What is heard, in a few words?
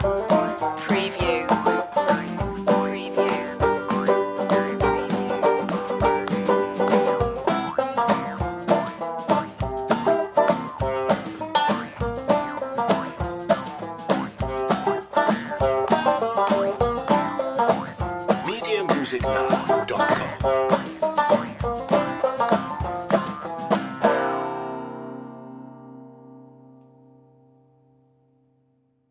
stomp